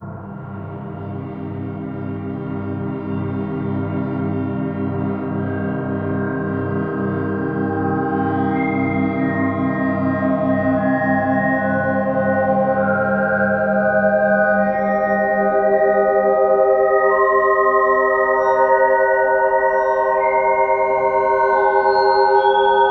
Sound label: Mod Wheel